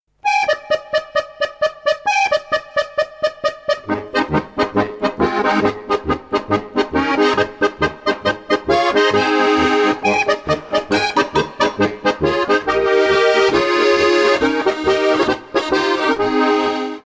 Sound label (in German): Polka